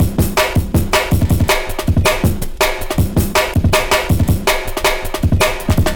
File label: Crunchy Breakbeat